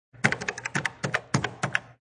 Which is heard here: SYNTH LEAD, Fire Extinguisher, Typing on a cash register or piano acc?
Typing on a cash register